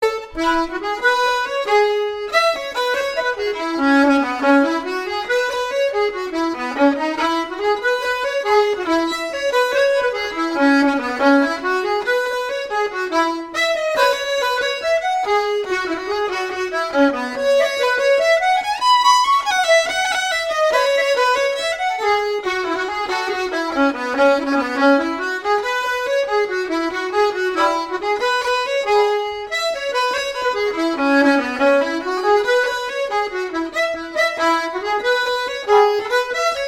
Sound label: Trip to Dingle Polka, Irish; Finnegans Players List of Tunes